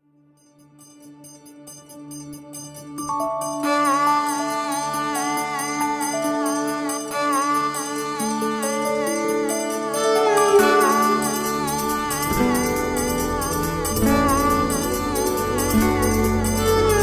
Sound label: Mesmerized By Rann (Instrumental)